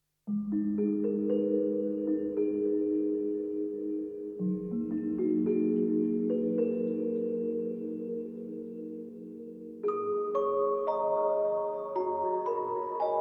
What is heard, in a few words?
KORG Wavestate MkII Bells Pad Factory Sound